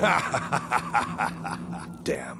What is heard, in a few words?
Laugh, "Damn"